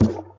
Collision

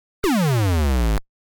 Die on Land; frogger arcade gamerip 1981

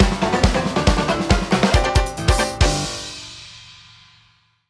Win Jingle; Event Battle 1

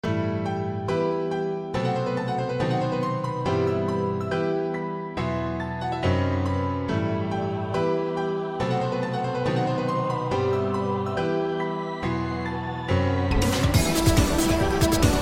Cinematic

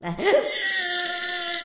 Shitting small shit

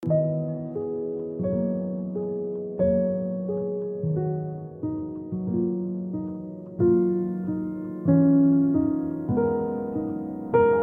piano test; gamerip; The Red Strings Club

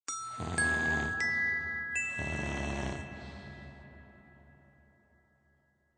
Snore Cave; gamerip; The Fancy Pants Adventures World 1

